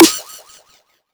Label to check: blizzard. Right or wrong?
Wrong